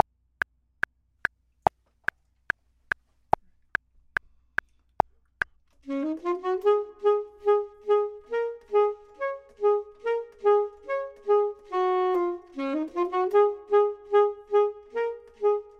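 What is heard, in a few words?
top, Saxophone and Clarinet; voxman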